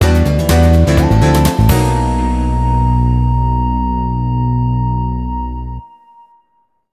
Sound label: Championship Race Finish; Gran Turismo 4